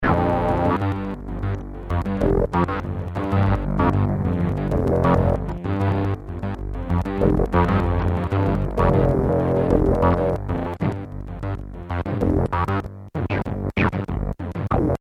Kawai K1 bass

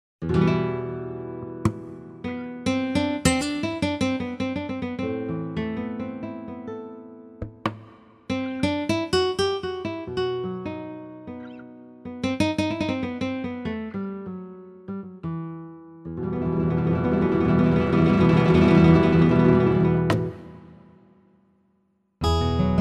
guitar

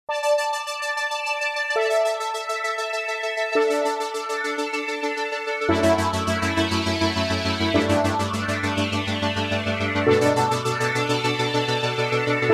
sfx

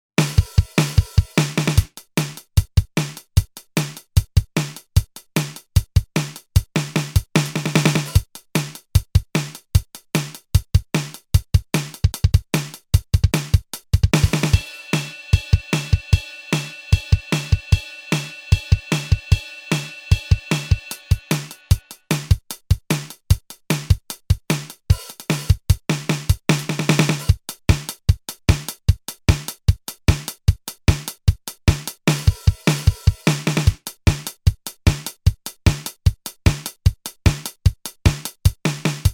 song

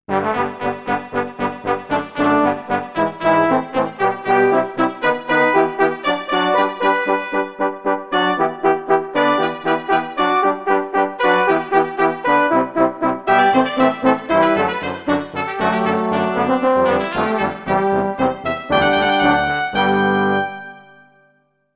brass

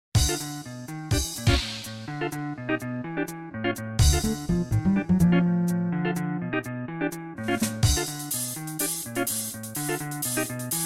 fastswing

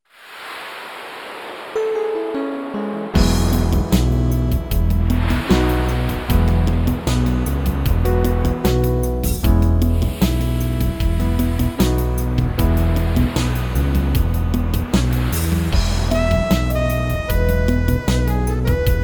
ocean

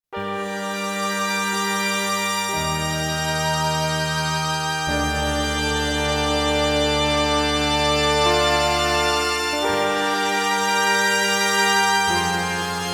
kawai k1 layered

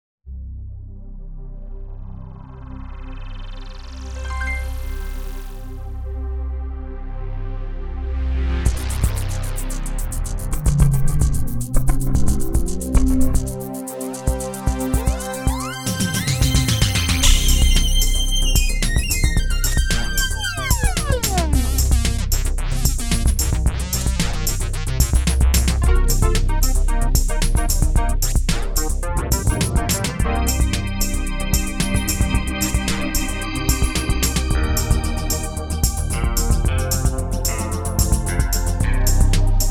techno

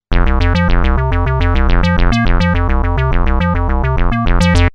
square

